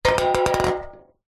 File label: tin metal cylinder lid on knock over on concrete; foley; zapsplat